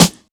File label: short snare single hit f key; snare drum samples